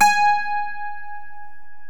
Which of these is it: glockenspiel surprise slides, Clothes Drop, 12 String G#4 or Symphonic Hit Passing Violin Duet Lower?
12 String G#4